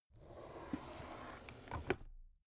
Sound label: drawer cabinet stuff open soft